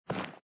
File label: jump